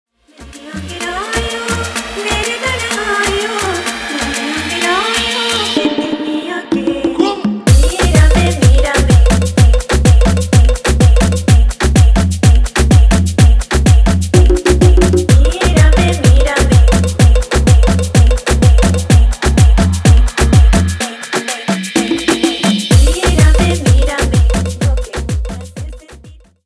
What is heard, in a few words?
afro remix 126 bpm